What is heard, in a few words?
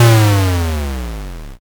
explosion l